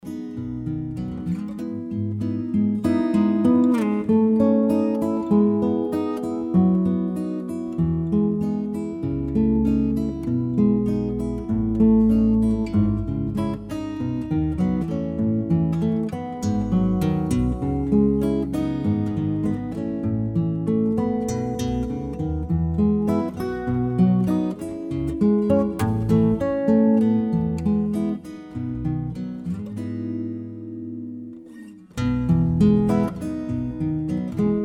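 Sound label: accordion Bells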